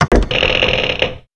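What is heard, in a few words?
Se Dungeon Door; Etrian Odyssey III HD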